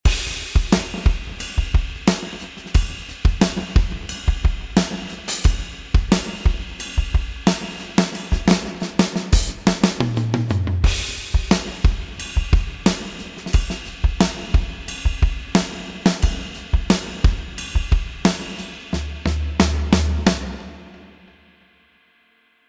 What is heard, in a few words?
Diffuse